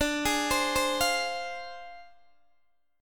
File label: D Minor 7th Flat 5th Guitar DADGAD